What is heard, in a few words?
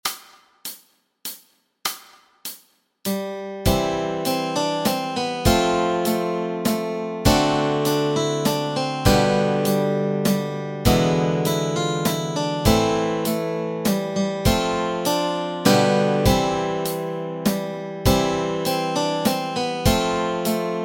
We Wish You A Merry Christmas melody